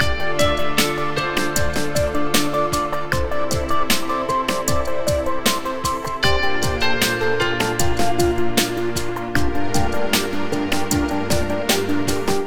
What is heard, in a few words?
corporate loop; Beatsuite